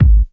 smooth steel kick drum g sharp key, Royality free bass drum tuned to the G# note; kick drum samples